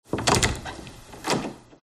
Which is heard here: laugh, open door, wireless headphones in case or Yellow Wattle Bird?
open door